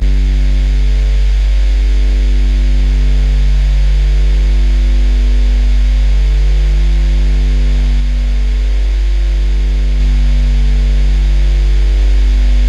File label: Asymmetric Noises